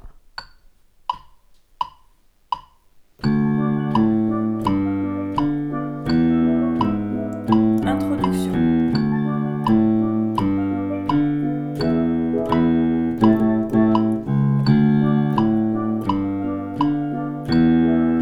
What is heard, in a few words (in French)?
beurre basses